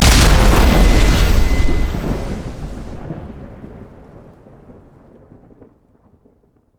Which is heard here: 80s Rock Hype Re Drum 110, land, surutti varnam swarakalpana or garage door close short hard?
land